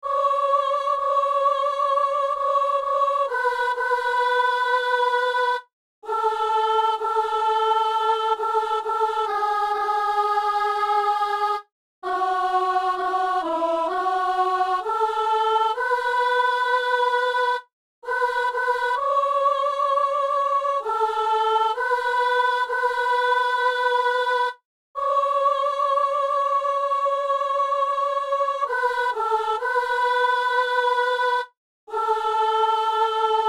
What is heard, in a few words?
soprano; Nés de l'eau et de l'Esprit